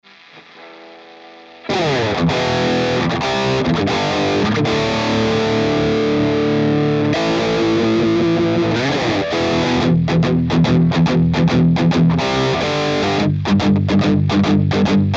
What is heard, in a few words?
jvm 410h, marshall jvm; redwirez